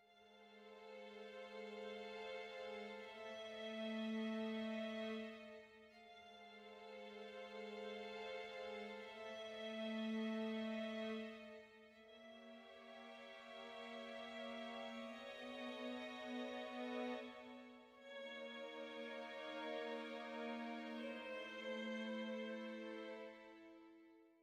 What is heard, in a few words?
dark soft